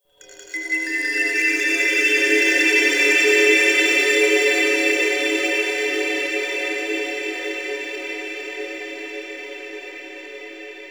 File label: Kalimba F, Ambience; Warframe; Nokko Colony